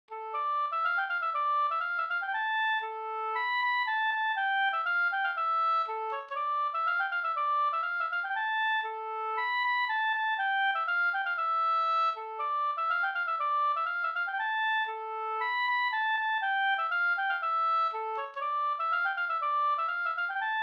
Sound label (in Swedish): polska Bingsjopolska Vallatspolskan